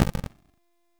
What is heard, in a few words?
Camera Spot To Operator; Warframe; Lotus Eaters; Quests